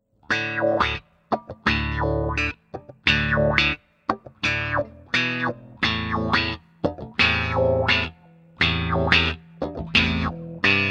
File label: Wah wah